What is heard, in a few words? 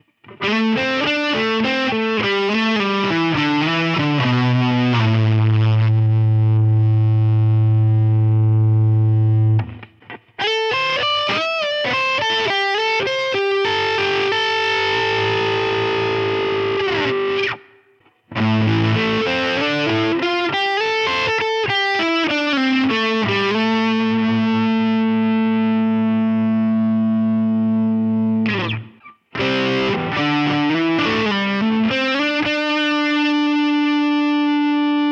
Lead